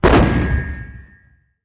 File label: 175mm Howitzer Fires